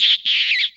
squeak, percussion; Misc Percussion

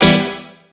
techno; Notes

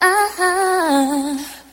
"oh ho oh oh", sung; ooh ahh

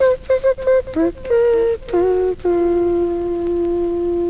voice doo dada doo doo dah dah doo; Phonky

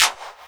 Snares, Clap n Snap

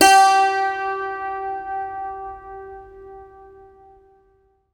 sitary, Notes

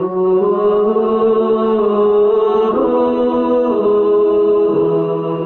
voice old woman mourns; Loops; Sad

